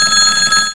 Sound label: ring